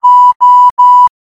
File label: three beep